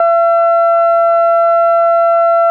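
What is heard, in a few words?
Yamaha CS80 E 7; ESOTERICANLG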